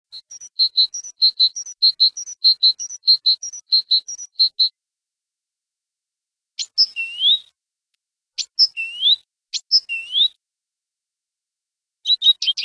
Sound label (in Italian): canto cinciallegra